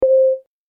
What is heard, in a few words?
altair, sony xperia bildirim sesleri